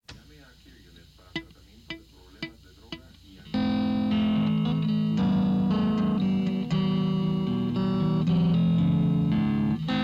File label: Riff Tapes